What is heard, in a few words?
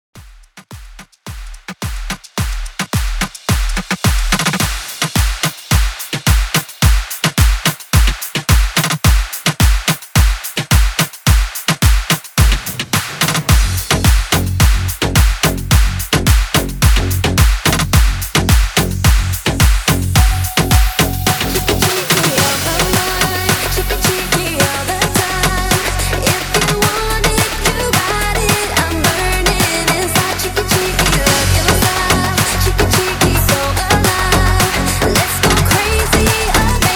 MOOMBAHTON 108 BPM